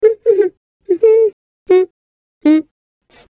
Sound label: Shy Hum; F.A.M.P.S